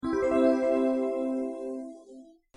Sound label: chime; marubayashi; secret